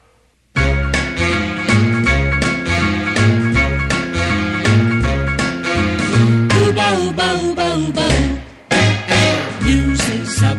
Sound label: rock and roll jingle; WGH Jingle from the late '50s - early '60s